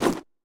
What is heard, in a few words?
Jump